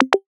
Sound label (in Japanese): メッセージ